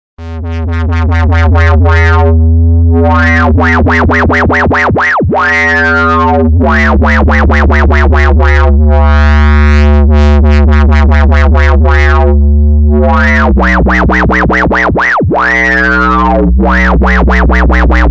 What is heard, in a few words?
bass